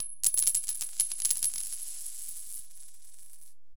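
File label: Coins Fall On Concrete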